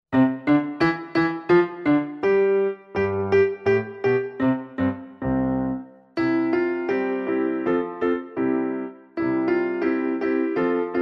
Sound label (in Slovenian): KLAVIR; RAC RAC RACMAN